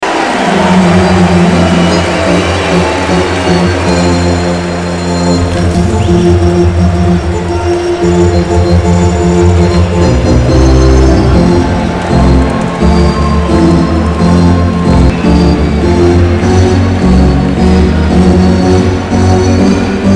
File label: ORGAN; OLYMPIC